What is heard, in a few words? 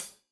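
hat closed, hi-hat cymbals stereo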